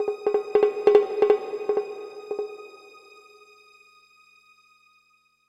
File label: Music Game Haunting